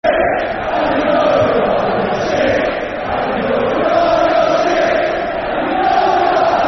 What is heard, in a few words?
Football Chants (Soccer Songs); Shit And You Know You Are-Bfc; Barnsley Bfc